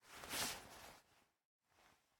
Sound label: Player Stand Up; Game rip; MiSide